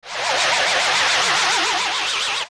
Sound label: Gohma Dizzy